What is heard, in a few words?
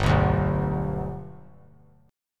G Major down; G strummed; JGuitar